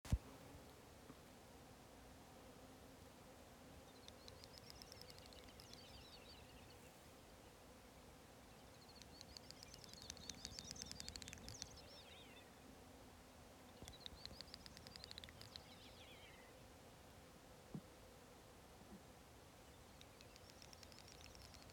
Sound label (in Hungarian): nagy sárszalonka hangja